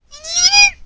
attack

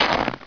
dirt, player; wartso

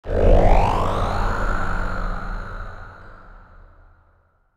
Alien Effet; Resurgence

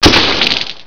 tube exploding death

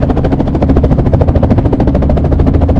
heli far

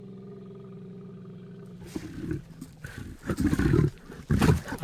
bear walk

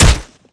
pwrench big hit bod; weapons; eqclassic

